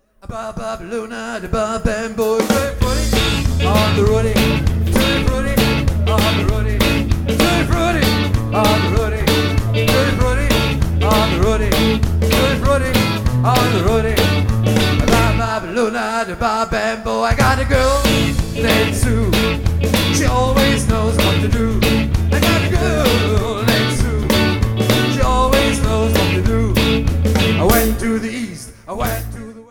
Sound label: tutti